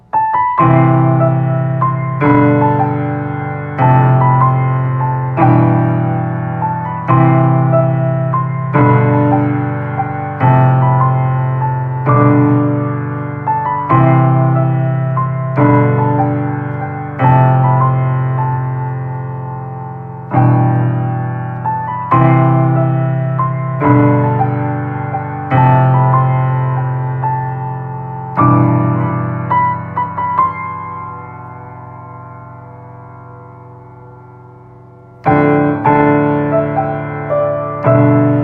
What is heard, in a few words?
piano; mahoyome